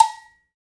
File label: COW, Cowbells; Roland L-CD701; PRC Latin 1